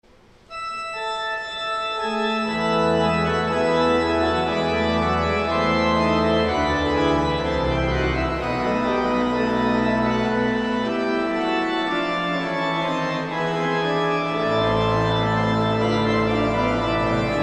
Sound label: Organ, Chorale Prelude; J S Bach; 'Christ, Who Makes Us Blessed'